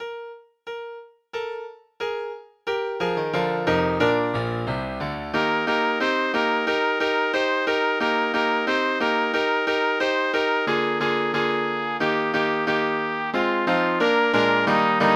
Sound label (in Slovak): pre trúbku a klavír; Prší, prší